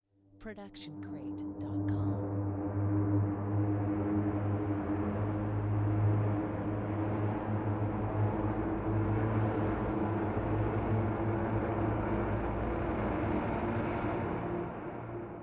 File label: Mysterious Riser Long; soundscrate